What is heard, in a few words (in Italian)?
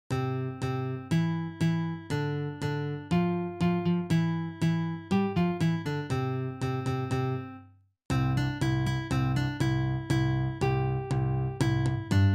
Melodia